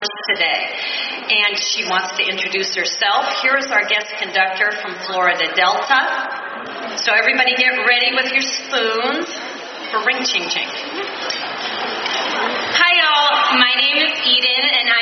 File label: Ring Ching Ching (Pi Beta Phi); At Convention!